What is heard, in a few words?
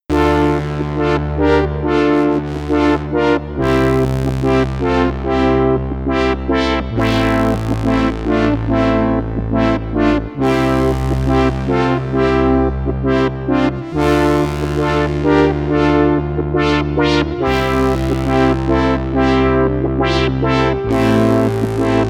Sequential Pro3 Paraphonic